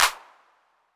Clap A 808, Hand Clap Clean Digital

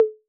Conga Hi B 808; Clean; Digital

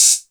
Hihat closed

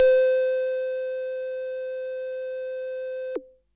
SYNTH Bloomy Higher

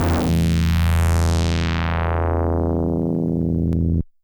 DX100 E2; rocket

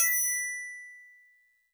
Tubular Bells DX100 B6

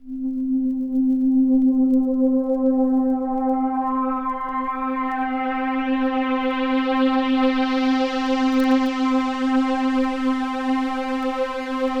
Polysix Slow Wobble Vinyl Synths C3; vinyl keys

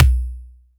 BD

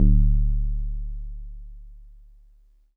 Wood Piano DX100 D1

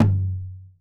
Tom Acoustic Crush Mid Chorus

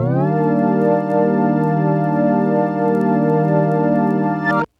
M1 Organ Slide Vinyl Synths Ebmaj7 D#2; vinyl chords

